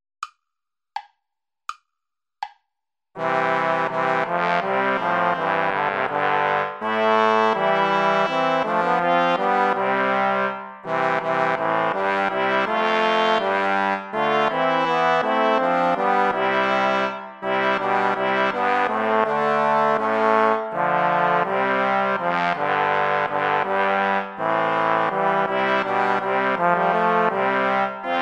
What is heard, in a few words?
play along without trumpet; praetorius michael; herzlich tut mich erfreuen